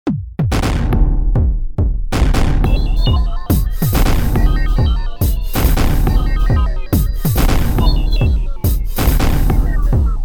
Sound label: techno; give me slightly a techno 2